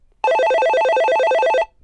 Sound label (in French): Telephone GIGASET DA710 Sonnerie